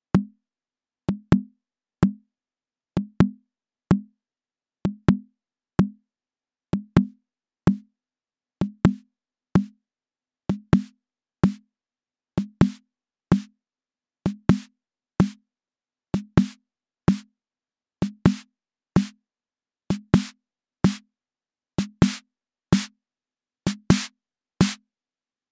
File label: akai rhythm wolf snare